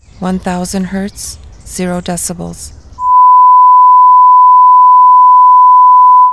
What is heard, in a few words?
1kHz 0dB